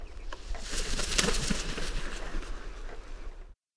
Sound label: turkey vulture